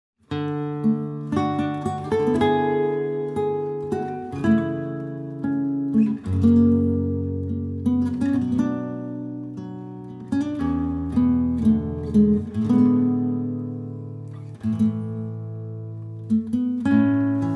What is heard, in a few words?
Peaceful Guitar